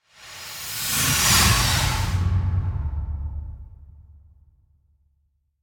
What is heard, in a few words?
Whoosh Harsh Flying Whooshes; Hollywood Edge; sound designer's toolkit v2